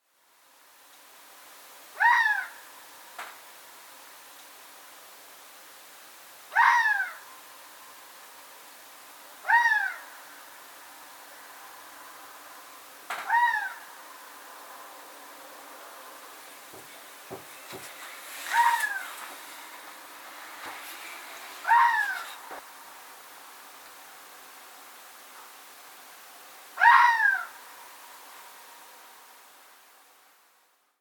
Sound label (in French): renard; cris d'animaux; enregistrés par Jacques Prévost de la LPO